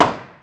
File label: POP; Sonic R; Windows